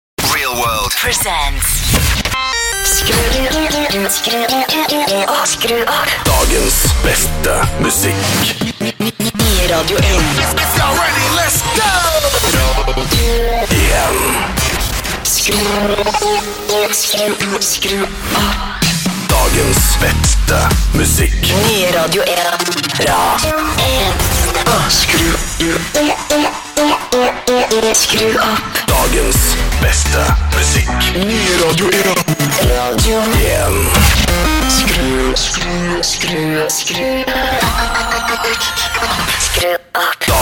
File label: Jingles; Radio 1 Norway; ReelWorld